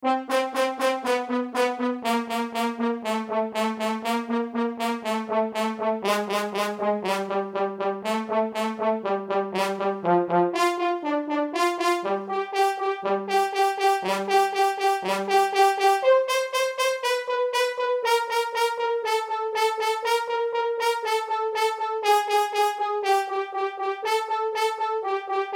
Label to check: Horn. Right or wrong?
Right